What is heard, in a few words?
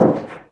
duct, player; firearms